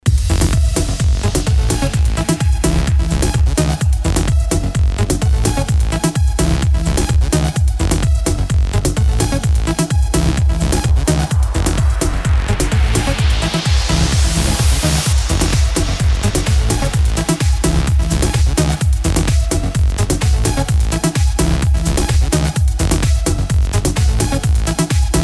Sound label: Cool Trance